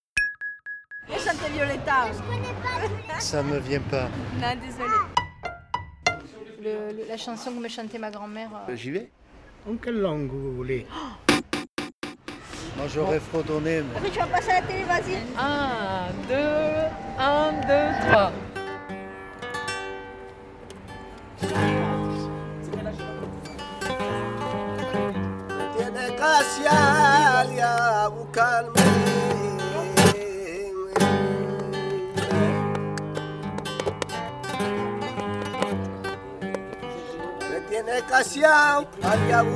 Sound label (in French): chanson